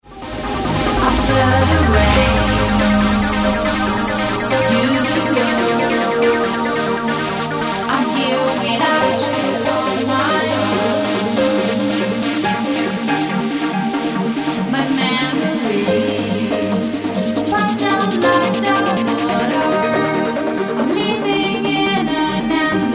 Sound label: trance vocal mix